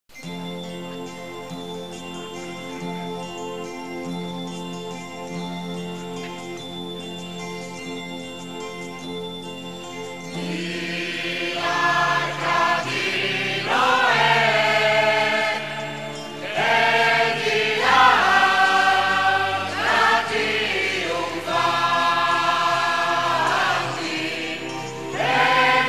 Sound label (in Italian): Processione di li Variceddi; paraliturgici; coro città di trapani